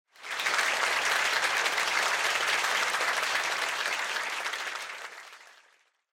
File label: Applause Crowd, Mid